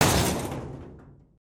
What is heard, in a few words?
collide, vehicles; aronwk collection; ndaudio